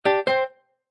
Casino Electronic Sound Winning Tone, Slot Machine: Electronic melodic sound; Casino, gambling; Lynne Music